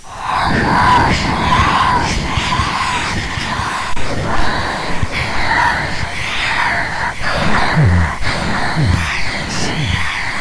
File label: Ghost Sound